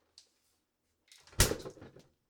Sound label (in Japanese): 冷蔵庫を閉める; 動作系